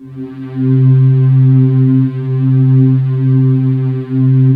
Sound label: HOOOOOO C2, Choir; USB Soundscan vol.28 - Choir Acoustic & Synth; AKAI